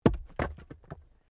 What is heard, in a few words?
Wood Log Fall